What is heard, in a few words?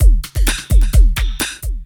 loop